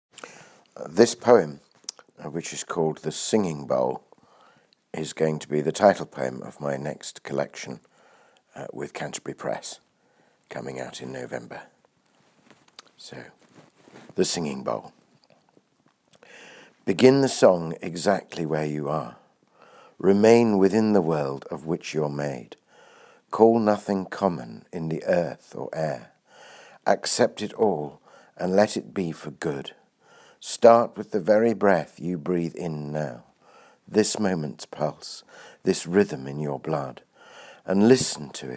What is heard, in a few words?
the singing bowl